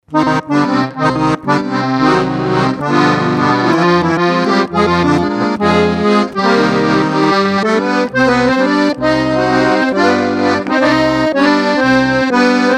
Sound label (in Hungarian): harmonika